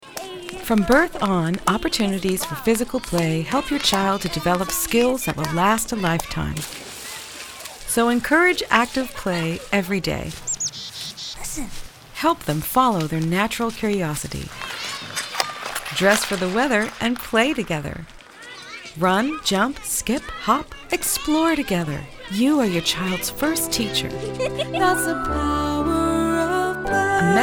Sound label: sounds of play